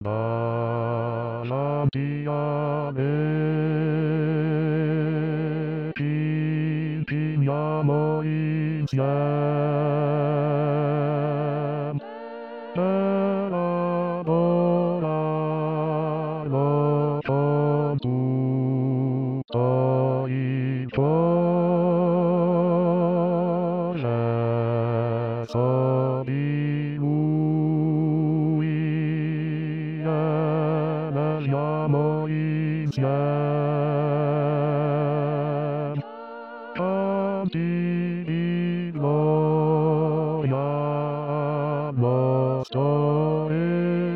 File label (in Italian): Bassi